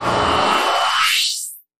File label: Hard Noise Transition